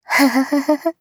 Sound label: laugh, JPN; Voice lIne; Eternal Return; Katja